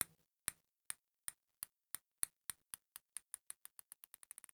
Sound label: Ping pong single